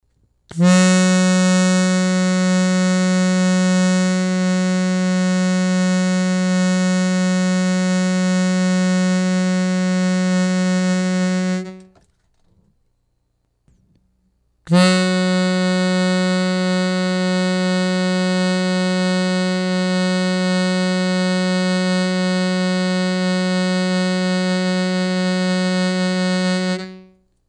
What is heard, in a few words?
reed, accordion; Bass; reeds broken.(F#); Reed's Pitch Sound; アコーディオン修復記